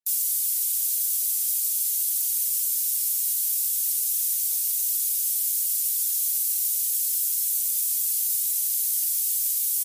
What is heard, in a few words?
noise hp